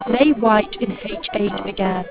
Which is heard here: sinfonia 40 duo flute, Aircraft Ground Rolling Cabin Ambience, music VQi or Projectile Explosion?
music VQi